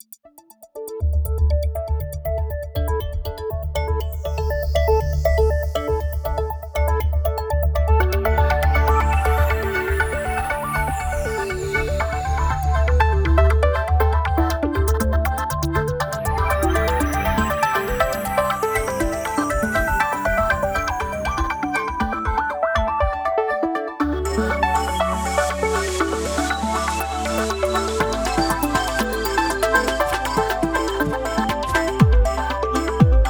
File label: Jingle long